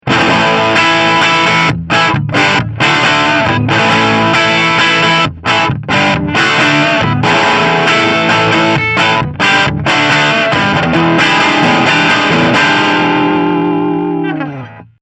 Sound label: Triode Full